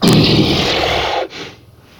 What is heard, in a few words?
overlord wizard pain